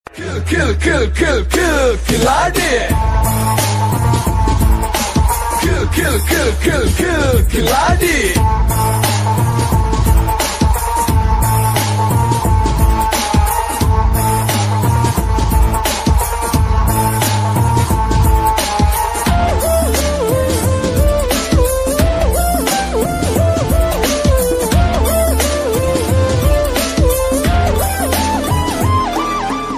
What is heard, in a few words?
telugu movie bgm ringtone khil khil khil; Khiladi